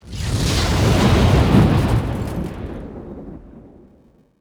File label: car explosion